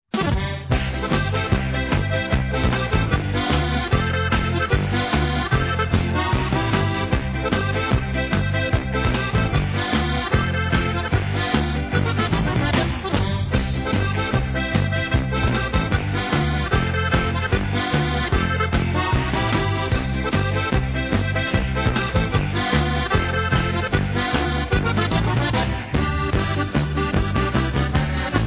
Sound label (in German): Polka